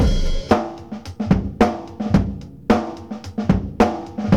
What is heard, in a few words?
beat simple funk 110 loop, acoustic